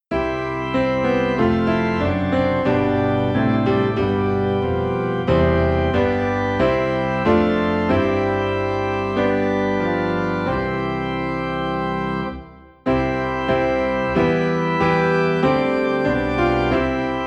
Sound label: Piano and Organ Low in C; christmas; Angels We Have Heard On High